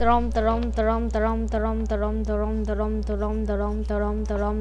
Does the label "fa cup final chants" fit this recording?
No